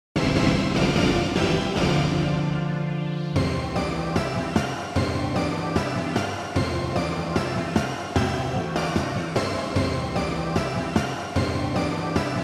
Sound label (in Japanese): 明るい